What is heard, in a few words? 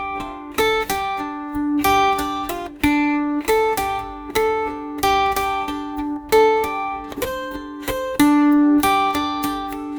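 indian instrumental shehnai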